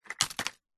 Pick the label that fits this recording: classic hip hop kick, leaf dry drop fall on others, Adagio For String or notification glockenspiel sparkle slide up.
leaf dry drop fall on others